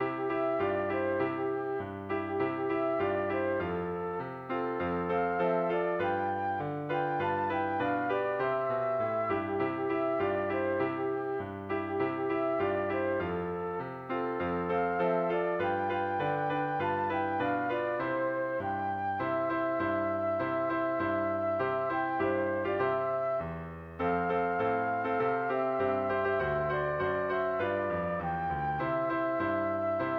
flute

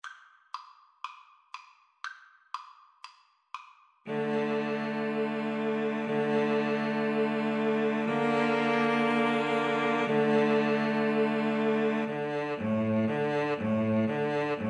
hava nagila, Violin Cello Duet

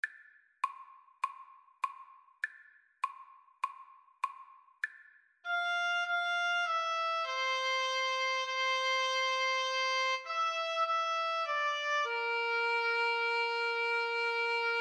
o sole mio, Oboe Duet

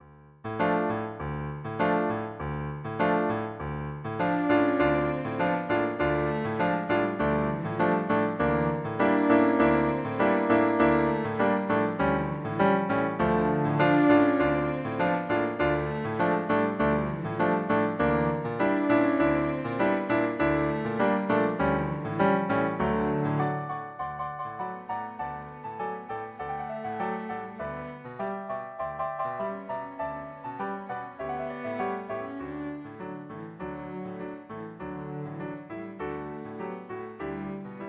bizet habanera, cello

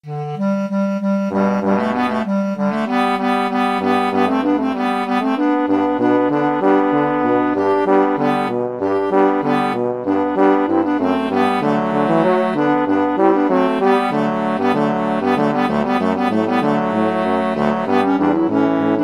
bass clarinet-trombone-tuba; rejouissance

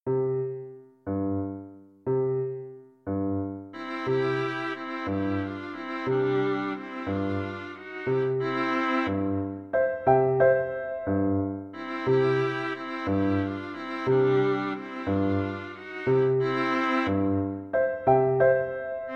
VLA DUET, viola duet; Capriccio

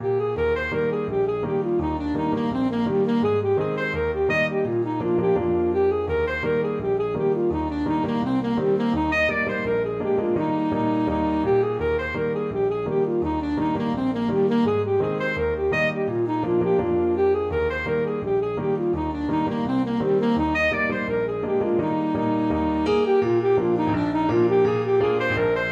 saxophone

